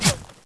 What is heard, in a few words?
whizz